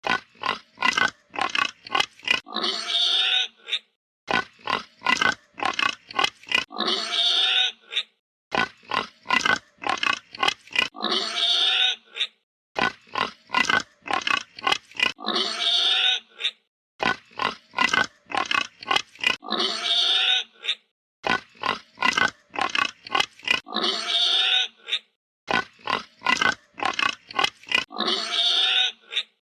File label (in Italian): Verso del maiale